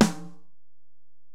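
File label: Acoustic Snare, Alesis DM-PRO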